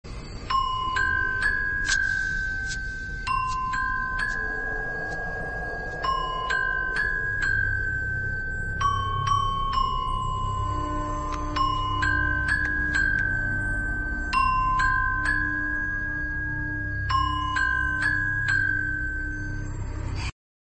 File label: horror tamil; d block; siva